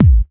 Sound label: clear kick A key; kick drum samples; drum single hits one shots